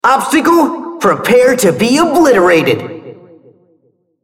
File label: attack; RumbleSkin03